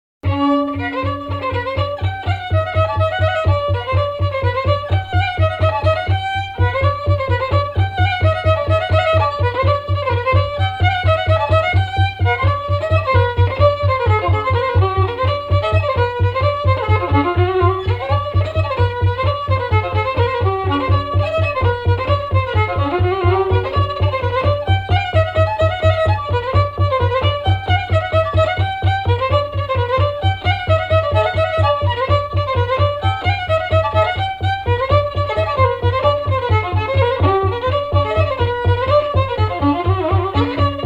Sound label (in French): Bourrée De Juille Bourrée